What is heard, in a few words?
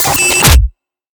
Scanner Shut Down; Plastic Surgery